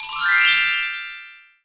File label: Hat Switch Reveal; birthday party bash; wii